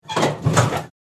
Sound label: Kitchen oven oven door open; cooking